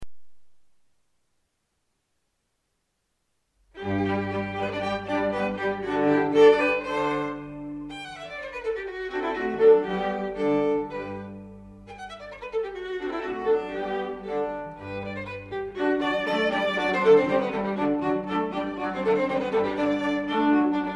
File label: String Quartet In G Major